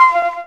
D.FLUTE; E-Lab; X Static Goldmine 2; akai

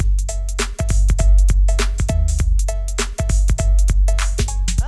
BREAK

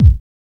BD; E-Lab; X Static Goldmine 1

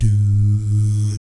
DOO SFZ, Vocal Jazz Fx

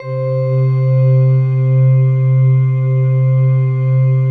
PAD, ATMO PADS; Best Service; XX Large

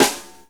SD FUNK, SNARES; E-Lab; X Static Goldmine 2

